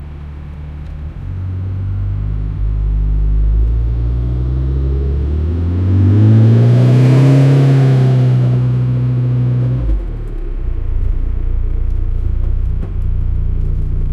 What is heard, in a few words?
driving a car over curb